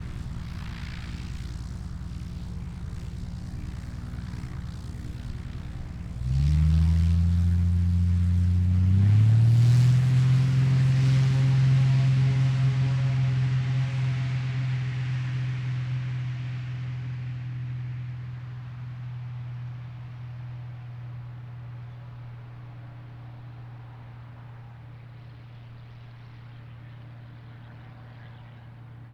Zivko, Edge, 540, Ext, Take Off, Beginning of Airstrip